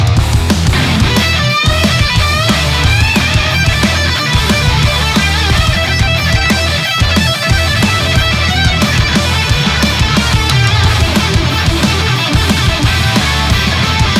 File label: air guitar emote; left4dead2; kodua; fortnite emotes